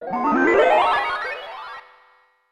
Rare Pokemon; Super Smash Bros

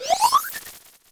Kamek Teleport Out

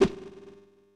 Moderate Whiff; Super Smash Bros

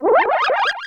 Mini Mushroom

